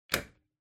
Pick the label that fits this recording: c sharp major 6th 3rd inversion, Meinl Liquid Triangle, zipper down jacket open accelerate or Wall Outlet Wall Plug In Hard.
Wall Outlet Wall Plug In Hard